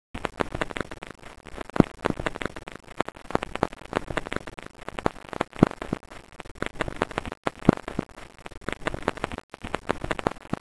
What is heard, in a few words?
minus crackle